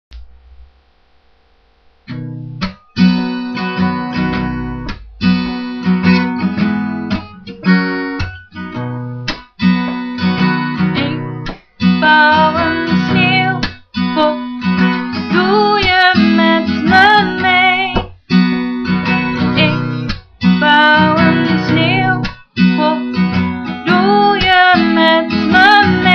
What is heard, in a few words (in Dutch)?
opname sneeuwpop; winter